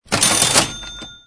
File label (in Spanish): caja registradora dinero